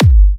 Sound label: G, Kicks; markus hakala